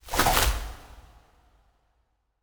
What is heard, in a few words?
Vinelash; These are the draft sounds for Vine Lash; Ancient Bolt is used by the Watertarg Excursionist to deal Arcane Damage to an enemy